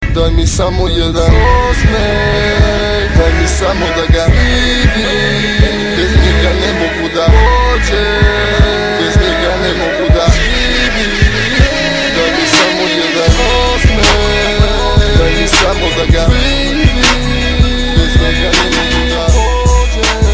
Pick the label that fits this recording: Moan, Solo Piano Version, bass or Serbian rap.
Serbian rap